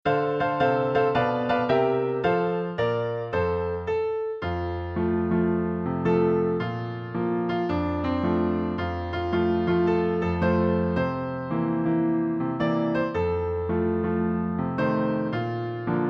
swing low sweet chariot instr; folk

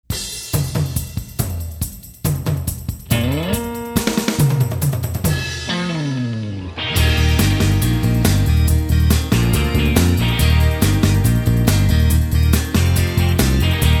Instrumental

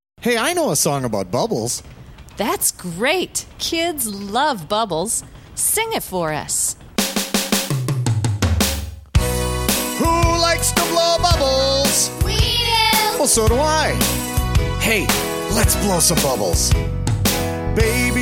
baby blowing bubbles

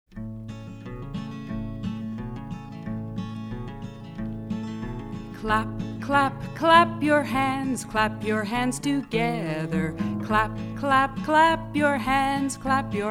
Clap Clap Clap Your Hands, Song

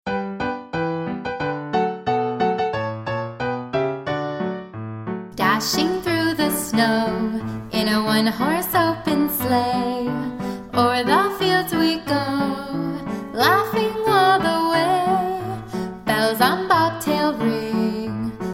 jingle bells vocal; jingle bells song

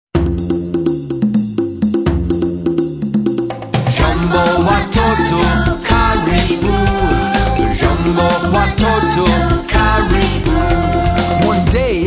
lion mouse, song

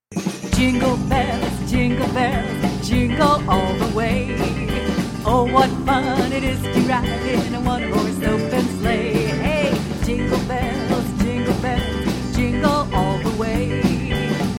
Rockin Jingle Bells

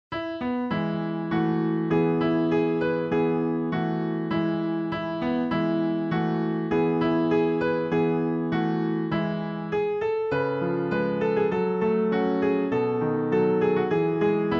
mon petit oiseau a la volette instr; French

